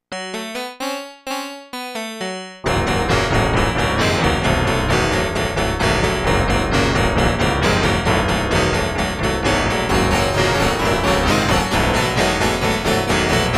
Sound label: mid esq1 mono timbral